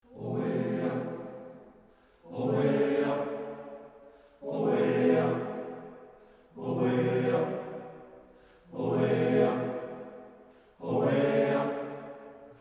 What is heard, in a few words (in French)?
musique